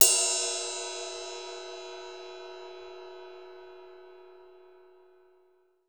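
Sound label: ride cymbal; DRUMS; USB Soundscan vol.03 - Pure Electro; AKAI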